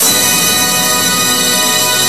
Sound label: MOVIE ENDHIT; Rubber Chicken Software Sample Bank